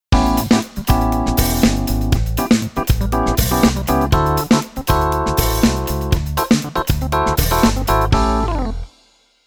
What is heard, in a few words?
Keyboard Pf Funk